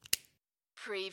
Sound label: highlighter pen close